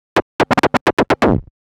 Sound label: Scratch Fills 140BPM